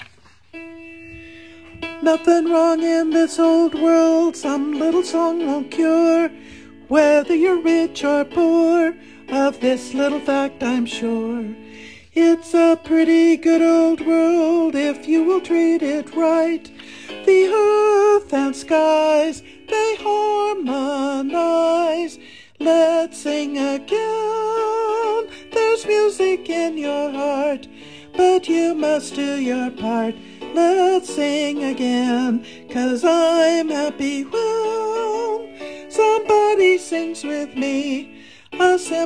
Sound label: lead and words